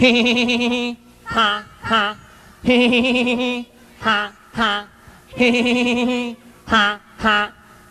hee hee hee hee hee huh huh; Voice

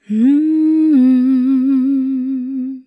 dragoness croons, stabs

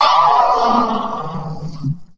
"ah", filtered; ooh ahh

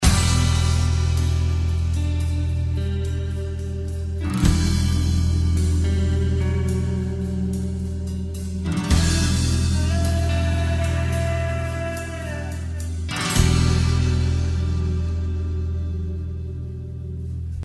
guitar, Loops, Sad

